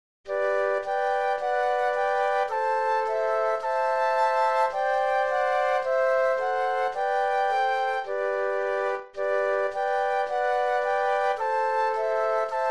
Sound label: Flute Trio